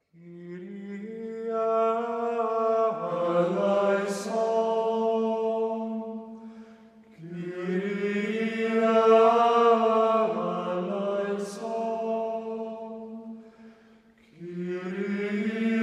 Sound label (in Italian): Canto Gregoriano - Kyrie; Catalogo musicale musica antica